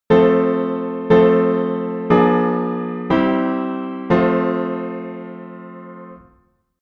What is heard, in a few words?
authentic I IV V, 16 edo; 16 edo theory